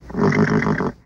Horse Whinny, Neigh, Closer

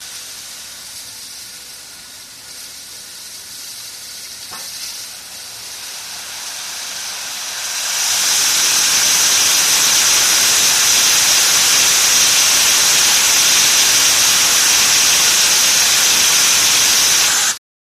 Challenger Steam Rel, steam train

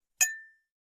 toast glass ting

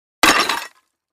Clay Chimney Tube Hit

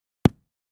Ground Hit Sledge Ham; rocks & dirt

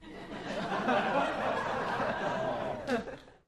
Crowd 100 Short Medium, medium int

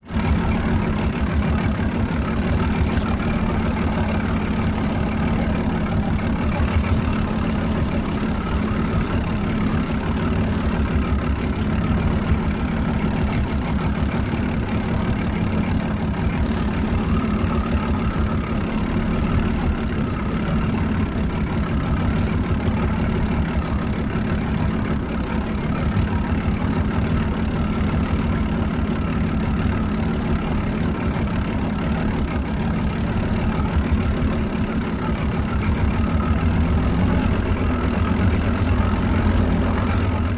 motorboat troll; motorboats

